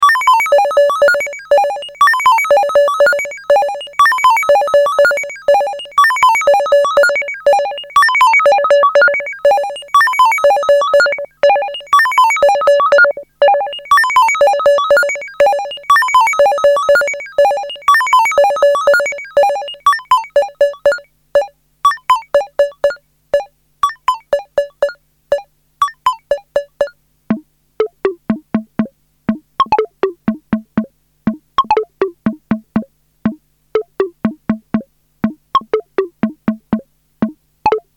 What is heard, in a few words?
EMS Logik